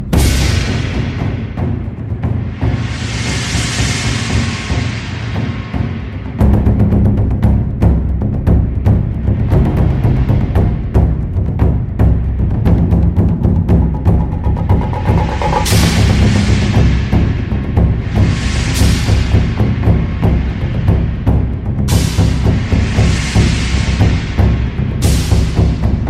fast japanese drums; ringtone

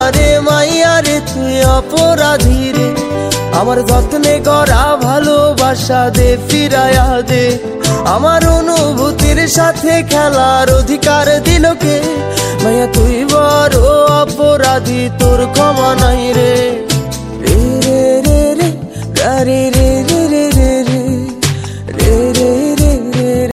bengali original song

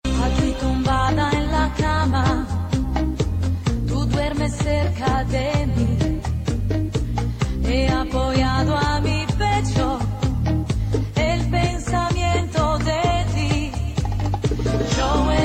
bachata ringtone

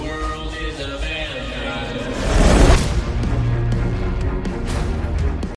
ringtone; rampage

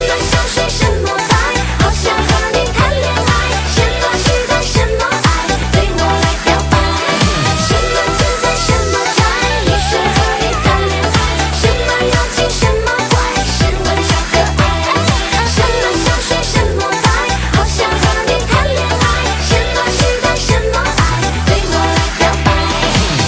chinese ringtone

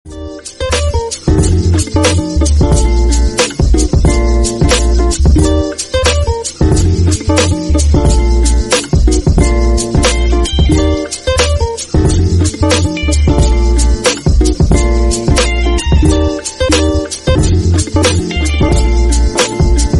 sunny day instrumental, ringtone

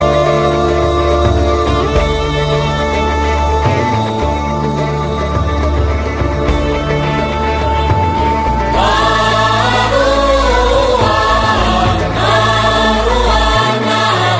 aboriginal music ringtone

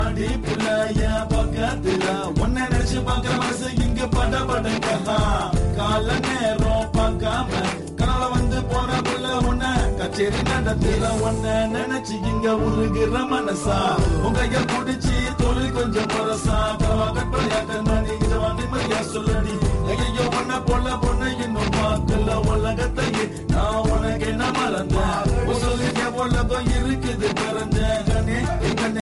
tamil malaysian; ringtone

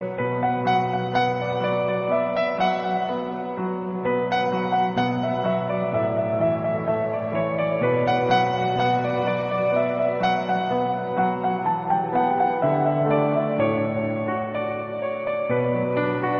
piano ringtone; anjaana anjaani

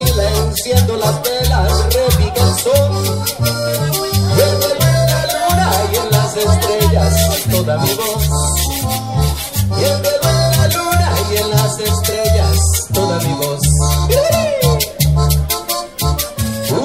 colombian and cuban song

